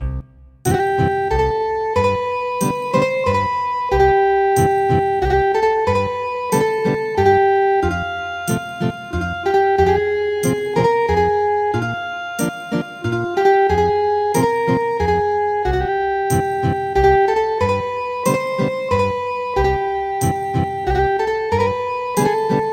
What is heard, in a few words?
Ethnic Guitar, traditional; The Farmer